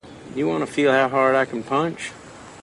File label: punch